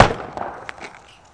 Hockey Puck Board Hit; Sports & Recreation

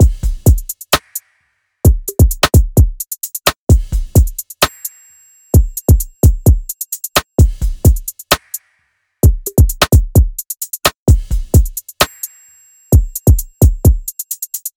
Genie Drum Loop - 130 BPM; Cymatics; Vibes II Drum Loop Collection

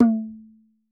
Double Bongo - A; percussion; Cymatics - Infinity Beta Pack 2.0; Zeus Percussion Collection

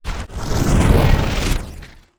wooshes transform sweetener

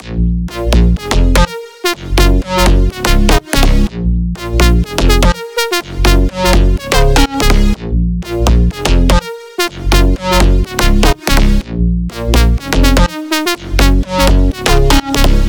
124 BPM A# Min Sidechained; Drop Loops; House; Cymatics; Reasons

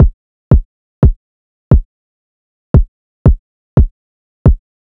Drum Loop 175 BPM Kick, Hip Hop; Cymatics; Telefono

